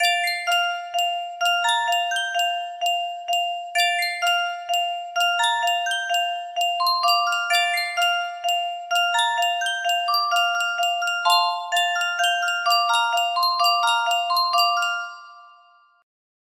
music box, music box melody

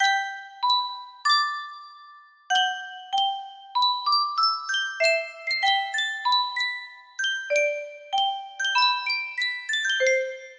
sankyo music box, music box melody

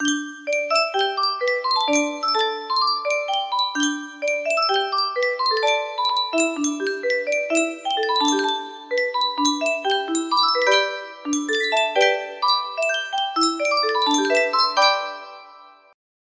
yunsheng music box, music box melody

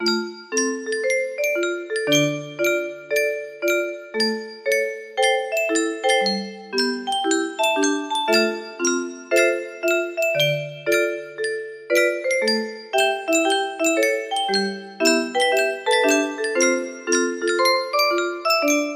melody

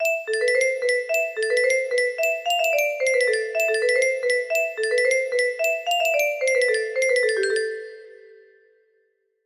music box melody

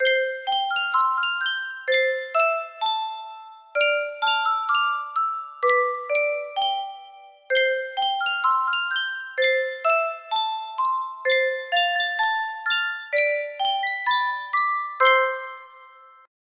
Yunsheng Boite a Musique, music box melody

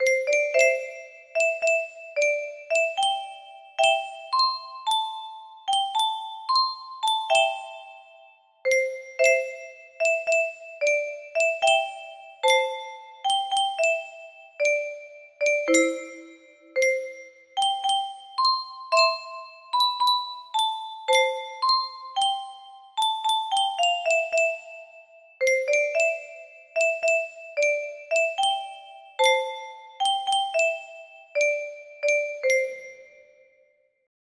melody

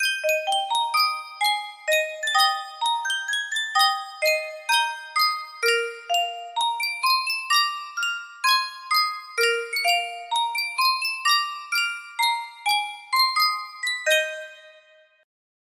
music box; go bobcats

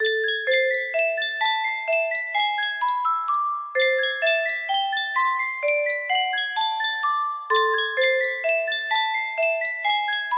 Yunsheng music box, music box melody

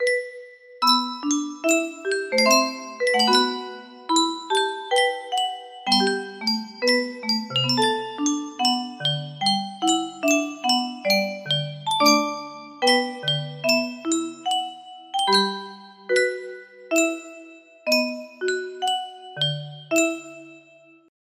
melody

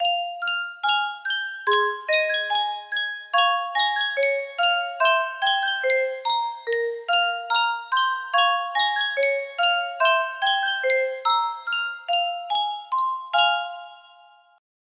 custom tune music box

